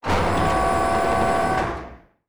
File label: servo big, sci-fi sounds, mechanical